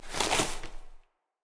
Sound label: Prisoner Page Turn